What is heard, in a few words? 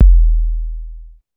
KICK, one shot drums; App Inventor Media